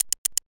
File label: Diamond Countdown